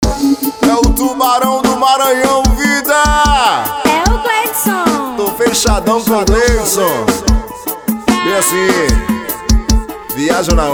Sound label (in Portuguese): pagodão jingle